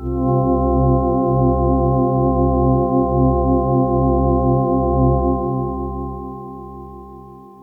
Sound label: Synth, Soft FM Chord